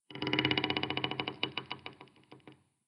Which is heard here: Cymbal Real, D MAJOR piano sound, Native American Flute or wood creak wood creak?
wood creak wood creak